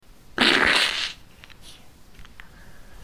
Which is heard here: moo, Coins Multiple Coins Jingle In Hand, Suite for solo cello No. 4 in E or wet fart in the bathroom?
wet fart in the bathroom